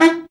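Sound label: baritone sax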